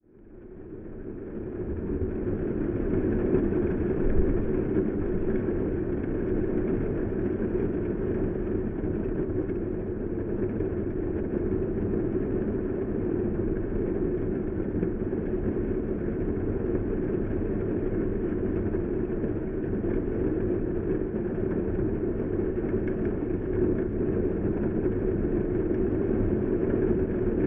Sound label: Geofon, Rain, Car, Interior; underground sounds